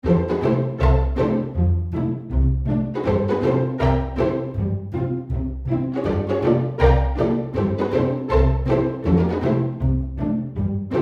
Studio Medium, pizz; Spitfire

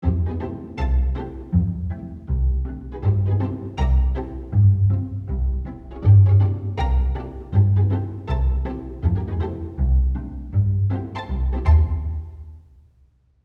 pizz; Spitfire Aperture Pinhole

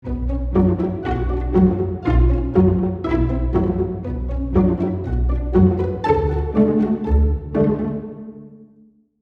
Symphonic, pizz; Hall of the Mountain King; Spitfire

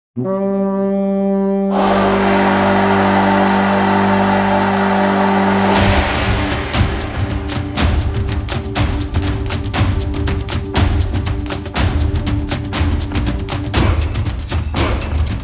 tiki chant

